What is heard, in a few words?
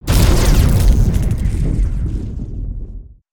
Lightning Rail End